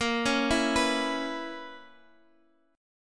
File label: B Major 7th Suspended 2nd Suspended 4th A sharp